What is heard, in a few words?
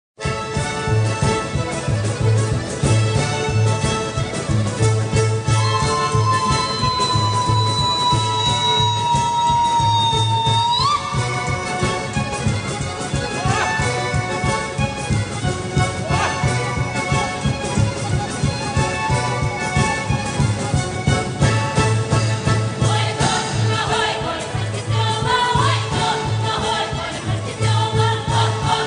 polka